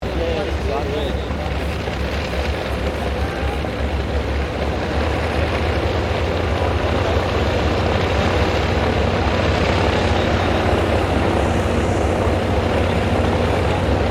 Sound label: unknown propellar squadran idle, Airplane; Various unidentified aircraft